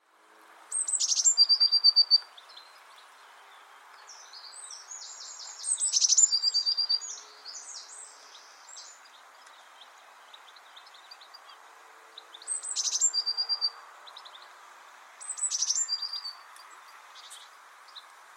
sykora modrinka Cyanistes caeruleus

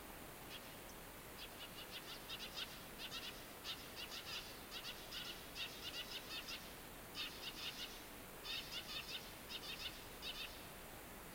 vrabec polni Passer montanus

